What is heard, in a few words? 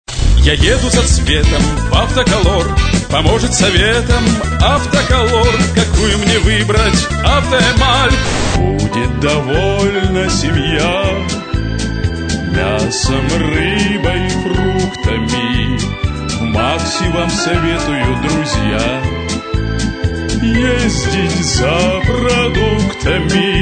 vocal; Vologda